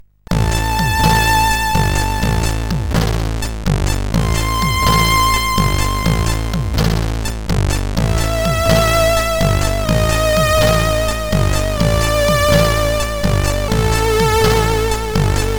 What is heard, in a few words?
delay, CSG8580R5; sid